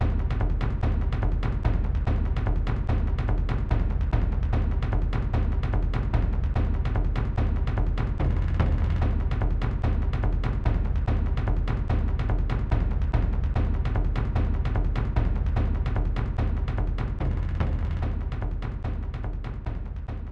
Drumroll; Mortal Kombat 4; N64; Fatality

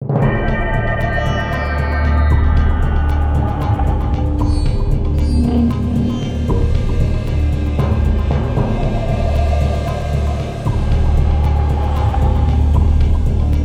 Field Jingle; Earth Seeker; Wii

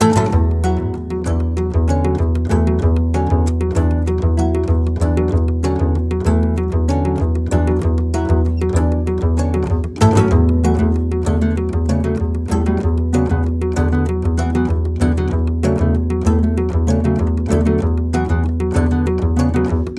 Menu Loop; Chasing Aurora; Wii U

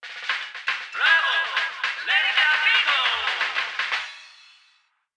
Radio Jingle

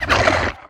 Monster Slime type Attack

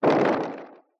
sliding door; Mystical Ninja Starring Goemon

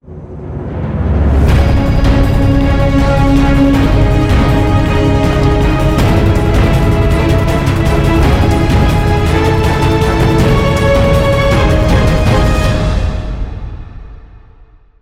time out winning

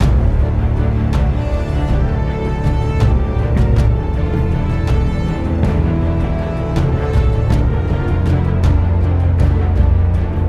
Loop Fight

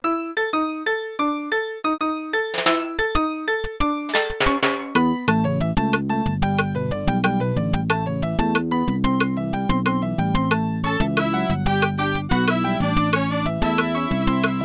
Salsa (Aircheck); animal crossing full gamerip

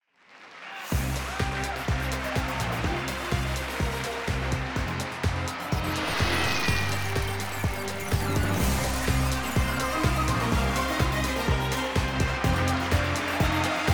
Intro Fanfare; buzz brain of the uk 2009 ps2

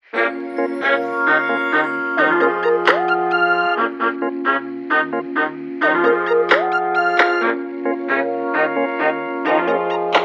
radio music

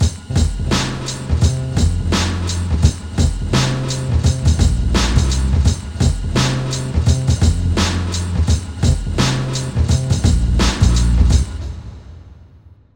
Ditty

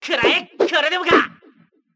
double slash; Mystical Ninja Starring Goemon

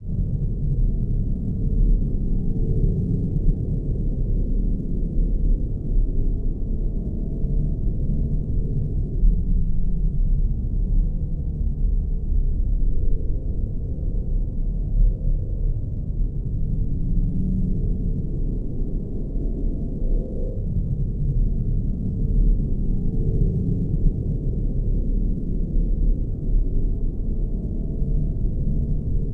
Launch Tone; Sid Meier's Civilization Revolution 2; vita

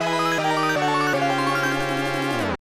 Losing Fanfare

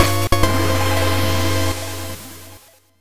Defeat Jingle

